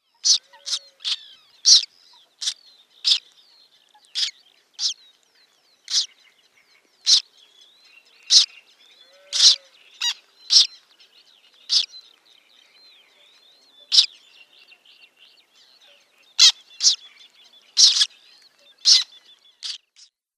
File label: Black Tern downy Young